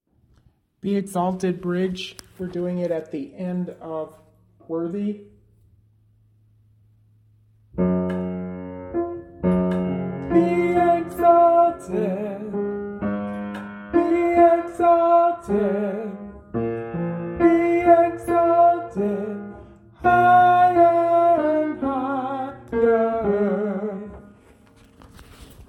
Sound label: Bridge alto Db